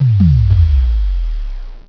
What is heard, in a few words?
acid swallows; Grid Warrior™ Demo